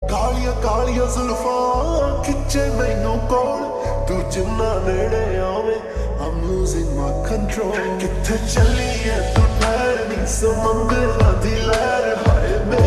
Ringtone Slowed Reverb

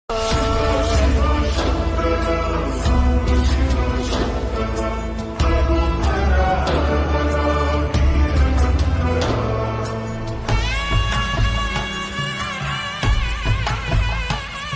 Slowed Reverb; telugu; kannappa

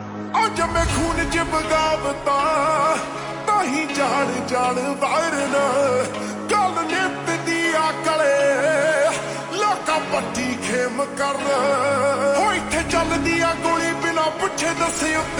Slowed Reverb

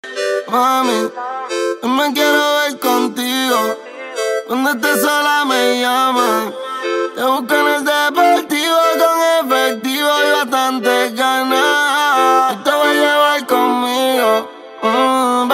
Ringtone

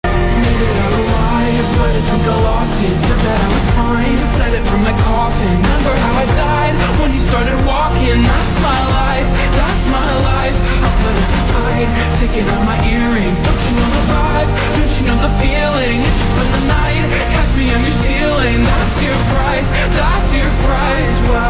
Ringtone Slowed Reverb

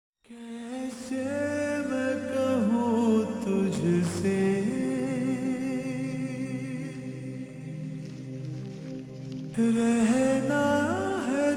Ringtone Slowed Reverb

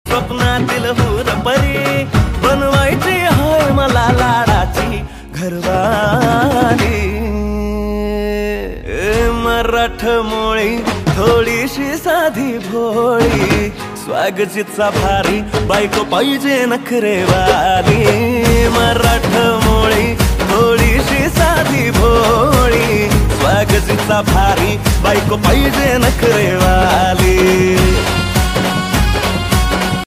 Ringtone

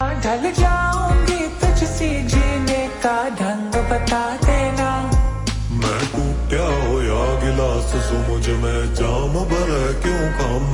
Ringtone Slowed Reverb

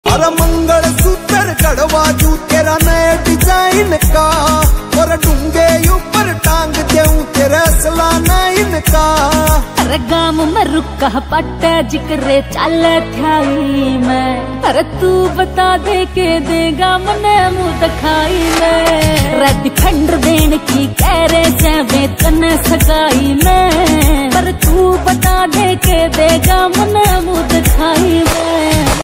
Ringtone

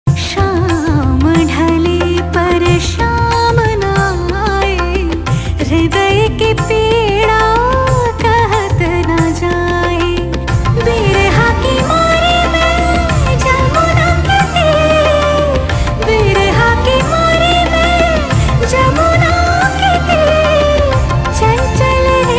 Ringtone; binodiini

